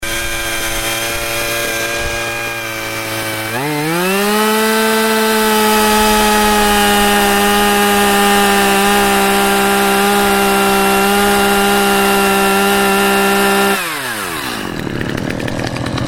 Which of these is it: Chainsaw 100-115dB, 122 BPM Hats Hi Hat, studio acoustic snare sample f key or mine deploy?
Chainsaw 100-115dB